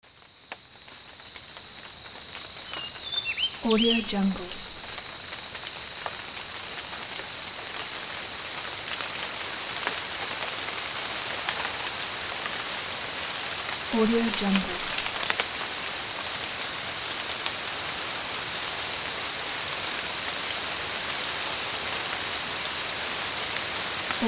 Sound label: rain in the forest; sounddream